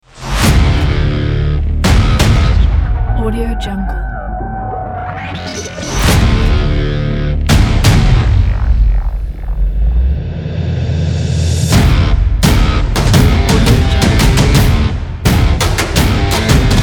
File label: epic sports rock logo